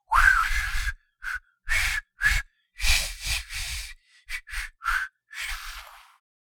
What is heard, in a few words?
Man trying to whistle